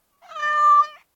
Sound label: Cat moan